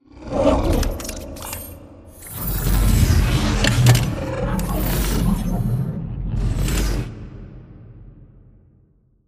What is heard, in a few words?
pipe idle reverb; bt; beacon; control room; Titanfall 2